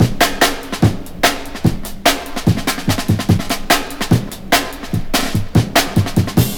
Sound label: 146, Jungle Breaks; Jungle Warfare 1&2